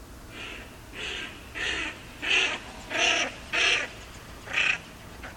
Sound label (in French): fuligule morillon